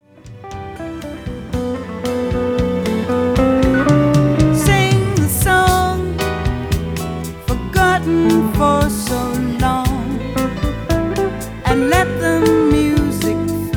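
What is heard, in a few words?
jazz female vocal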